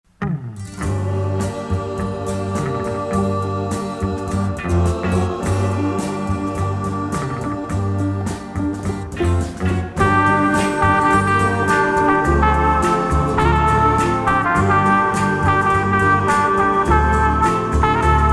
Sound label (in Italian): shake trombe e coro